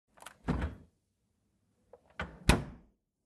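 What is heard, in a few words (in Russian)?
открывание закрывание двери холодильника; Включение, шум работающего холодильника и выключение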